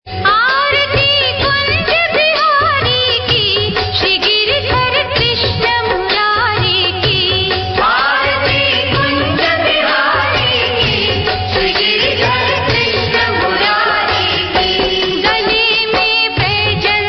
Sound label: Devotional Ringtone; Aarti Kunj Bihari Ki; Religious